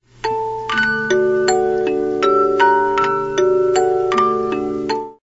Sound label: Nocturne Op 9 No 2, 50-Note Movements